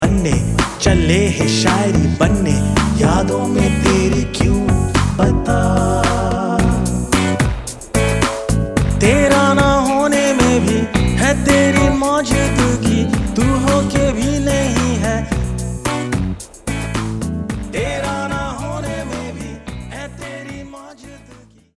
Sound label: MISS YOU HINDI MALE